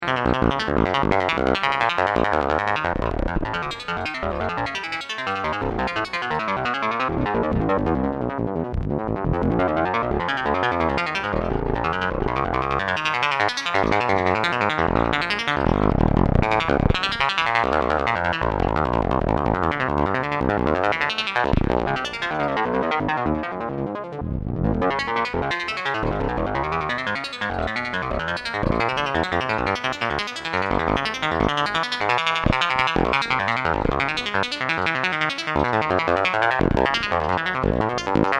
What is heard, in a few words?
highpass regen